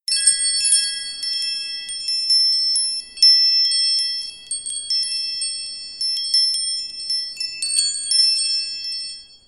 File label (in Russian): kolokolchik zvenit